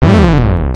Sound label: combo break; cedru vax amas